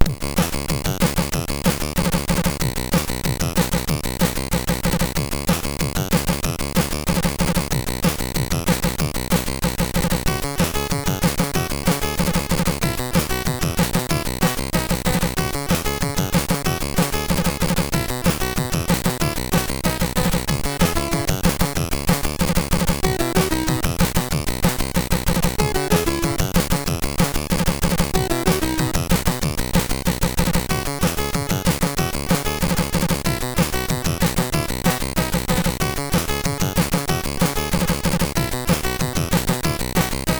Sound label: Rock Song, sid MOS6581R3; Signor